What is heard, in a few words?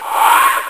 alien controller damage sound